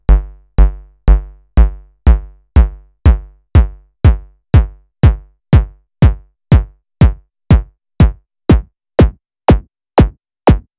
Kickain Schnelle Parameterfahrt PUNCH; Knobula

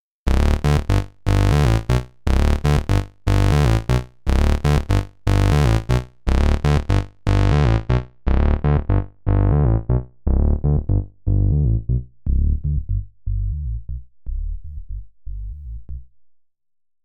Studio Electronics Tonestar 2600 Oszillator Mix Filter

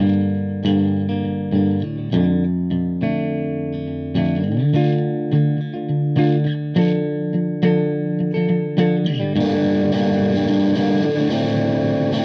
Verzerrer Pedale High Gain; Walrus Audio Ages

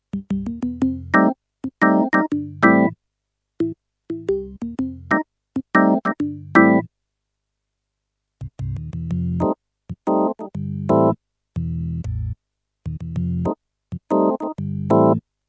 Alesis Prestige Orgeln

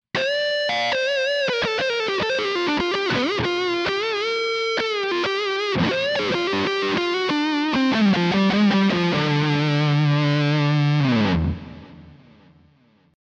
Les Paul 50s Hals Pickup Lead

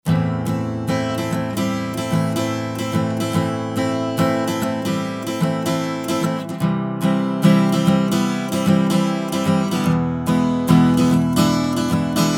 Tube Tech HLT 2A Western Gitarre Air

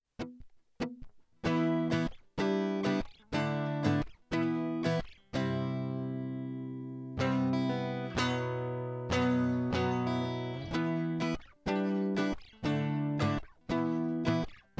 E Gitarre Avalon DI Digi 002 ohne dbx

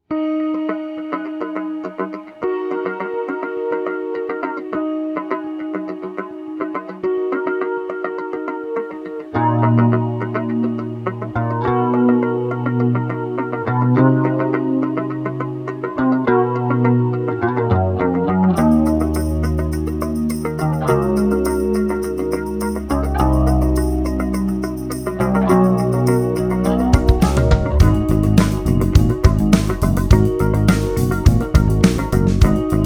4 Bässe; Sonic Farm 2di4

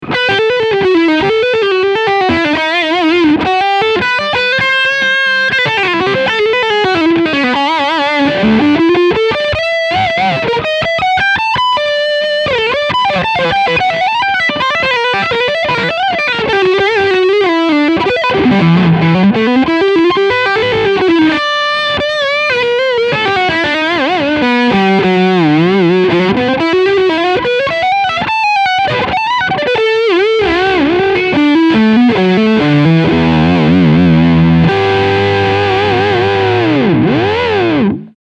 Leadsound Steg HB

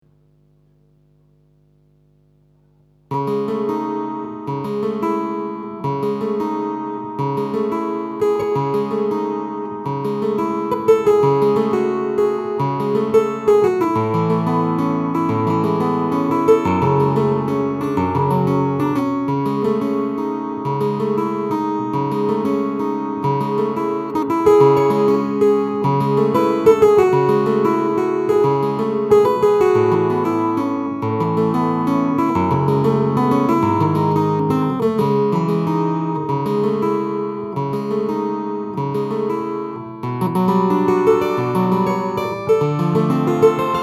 Clavichord Pur

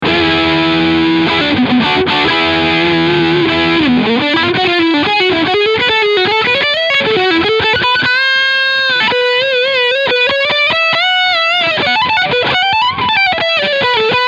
Leadsound Steg

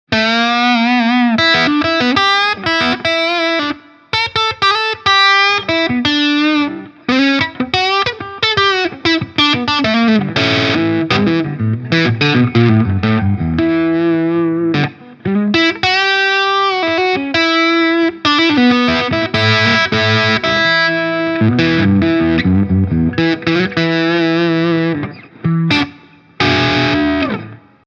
Harley Benton DC 580 Verzerrt Bluesy Pickups parallel

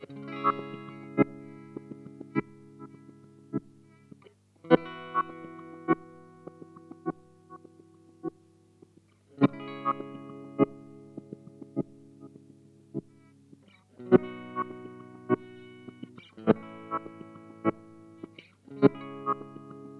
Boss SL-2 Attack und Duty kurz

